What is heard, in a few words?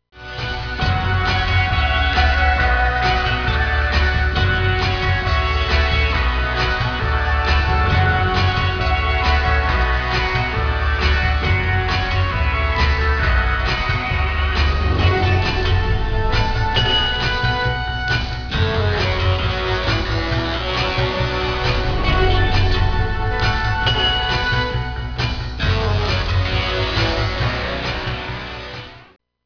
song